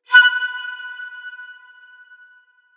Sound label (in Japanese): ピッ